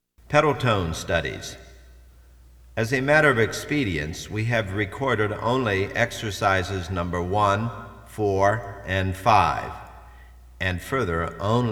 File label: Pedal Tone Studies